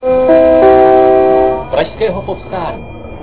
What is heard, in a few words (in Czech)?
c prijezd, zvuky metro; Pražského povstání